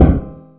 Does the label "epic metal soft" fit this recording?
Yes